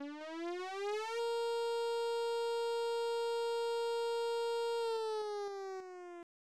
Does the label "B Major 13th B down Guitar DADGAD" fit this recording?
No